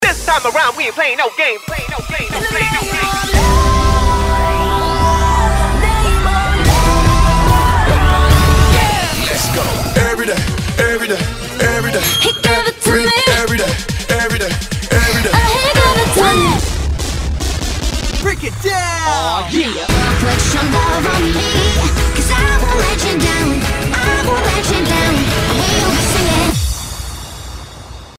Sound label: Pride Cheer